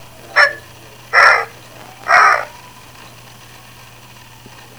Great Blue Heron

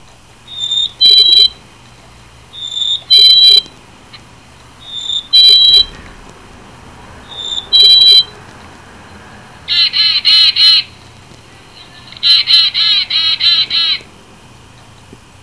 Black-Capped Chickadee

